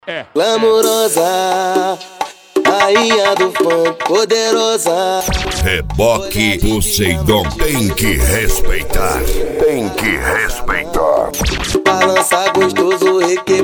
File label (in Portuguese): sound car; reboque poseidon sartori